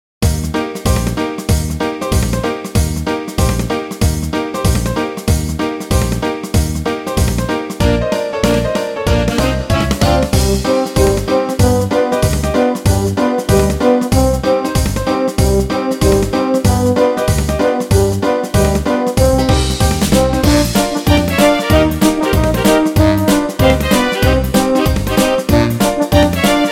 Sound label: alto